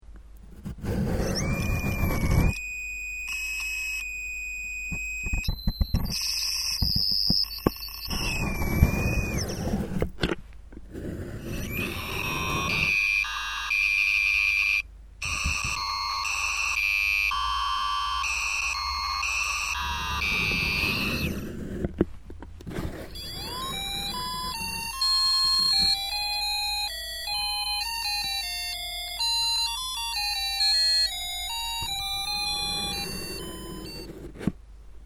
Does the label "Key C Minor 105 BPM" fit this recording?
No